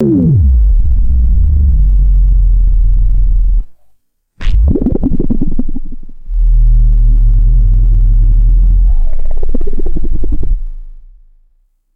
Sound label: liquid analog synth sub bass drops